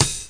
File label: hihat